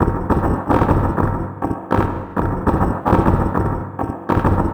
Diesel Process, Noise Circuits; Electronica

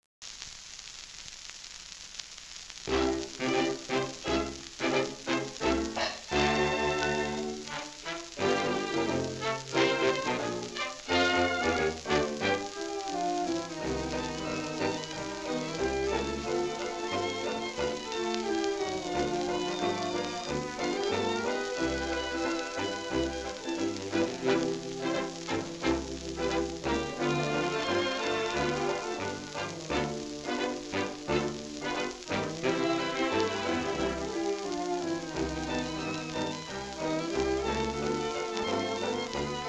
Orchestra; Victor

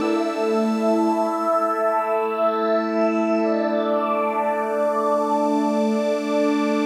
Pad; Dance

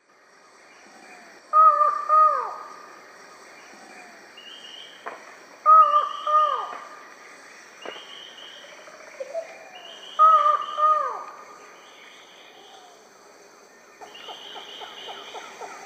Micrastur buckleyi, calls and song; S. America; Eastern Lowlands

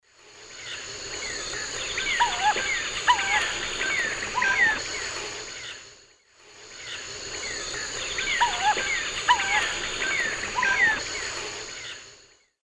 Querula purpurata, flight calls; S. America; Eastern Lowlands

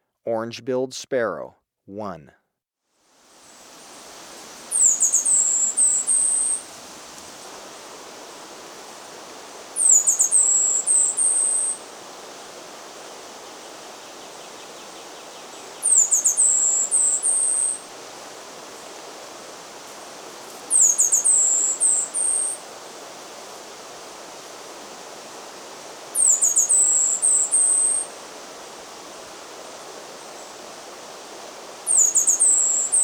Orange-billed sparrow, Birds of Ecuador; S. America

